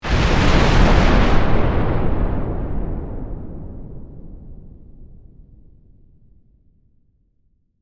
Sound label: Explosion Massive, Warfare, Explosion, Massive; Bomb Sound Effects; Explosions